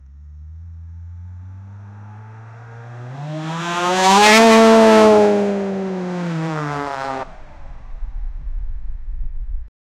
a GT3 V8 car roaring; motorsport

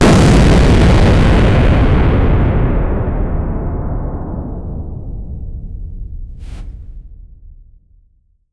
a holy energy arrow fires

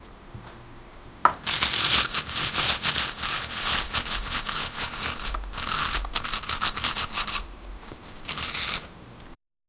painting, brush stroke

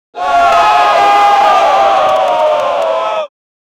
shouting in the bat, battle cry

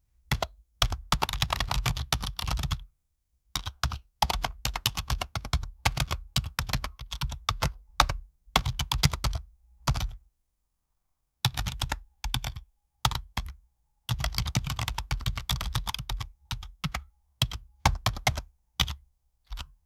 pad keyboard sound

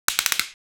paint gun shoot

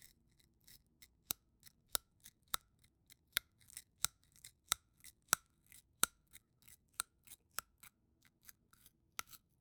chopping tomato

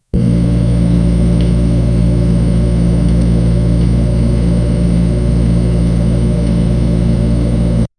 quiet kitchen bedroom fain, ambient hum

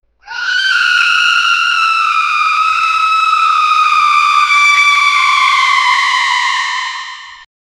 alien screaming

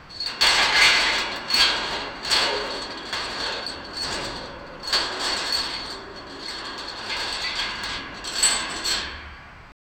distant muffled clangs of, dungeon

